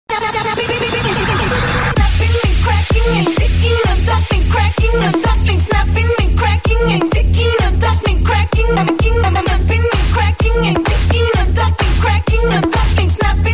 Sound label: dj track ringtone